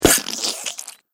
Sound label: Fight Rough Blade Rips Flesh; Battle Sound Effects